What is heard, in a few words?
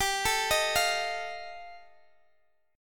G 7th Suspended 2nd Sharp 5th G chord, Guitar Open G